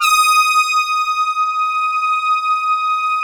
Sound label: HORN, MATRIX 12; Big Fish Audio - First Call Vintage Keyboards